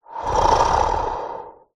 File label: horror creature monster breathy growl; zapsplat